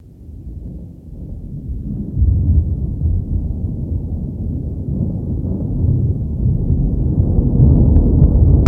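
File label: Earthquake Explosion Distant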